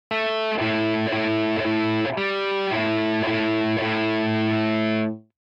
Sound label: riff lesson